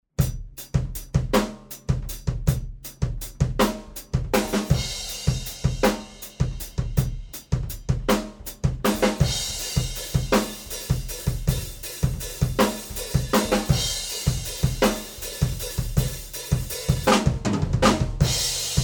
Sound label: Room Mics Torch